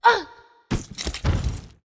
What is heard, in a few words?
Mob die; Monster; 설검수